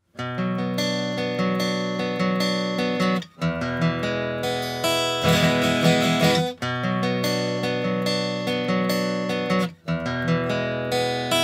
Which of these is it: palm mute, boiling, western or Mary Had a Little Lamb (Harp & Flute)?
western